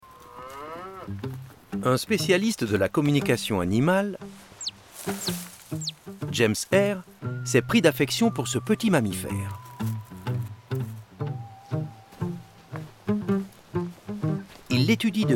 A l'écoute de la nature; Pariscience 2020; GEDEON Programmes; ARTE G.E.I.E

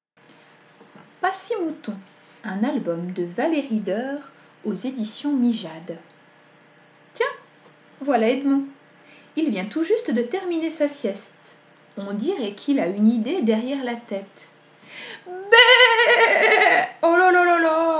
pas si mouton vocal

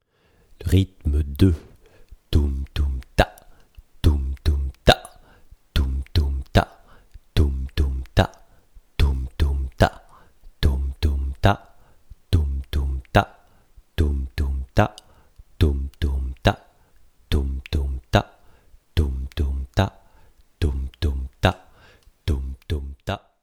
rythme

